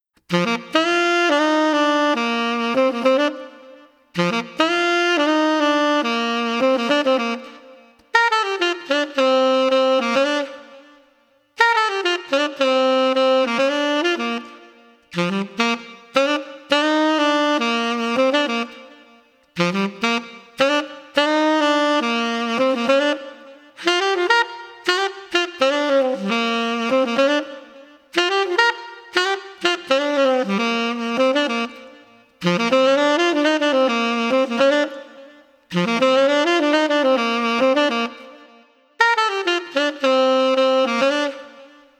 Street Sax Marvelous Mood 105

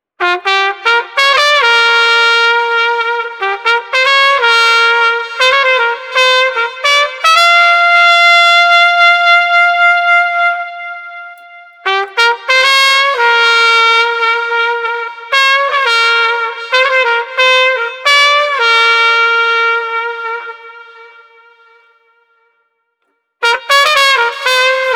Street Bluesy Trumpet 85

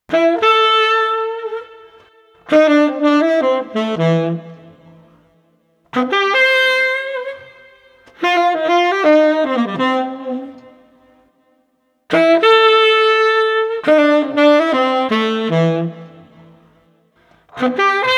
Tenor Sax Groovy 80

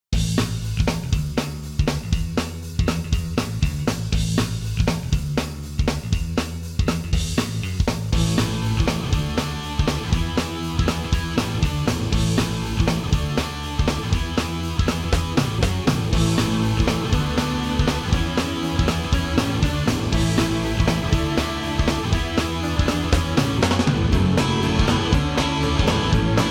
Pop Punk Instr

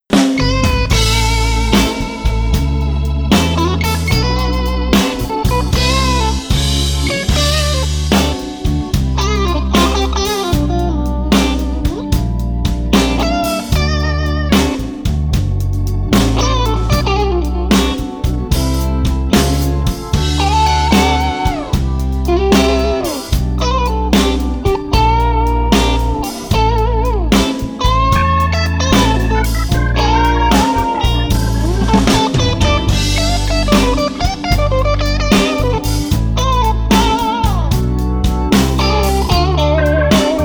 Blues Rock

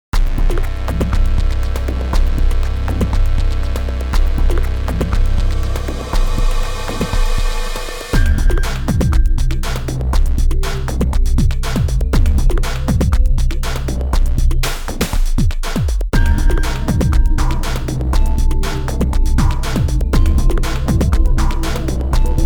Electro-Static Incursion 120

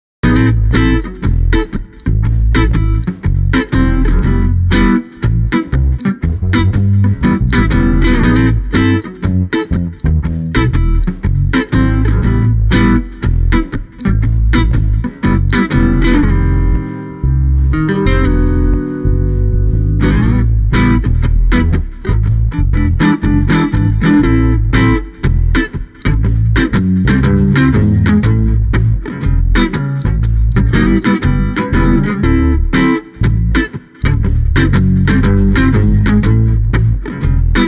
Guitar Bass Funky Relaxed 120

